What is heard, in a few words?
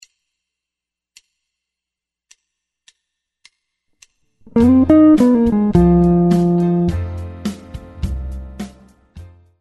guitar